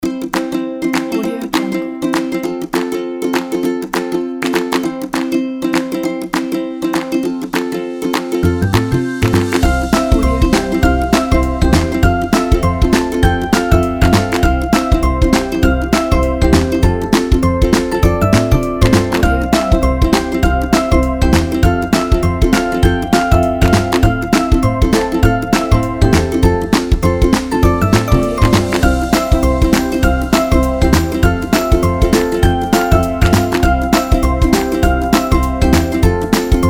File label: happy mood